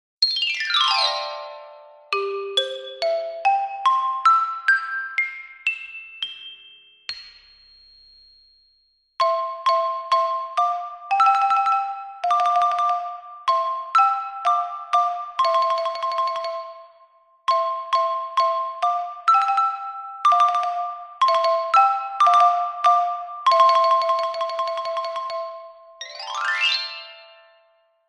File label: instrument